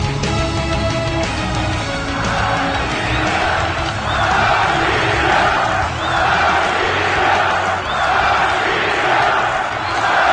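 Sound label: Russian fans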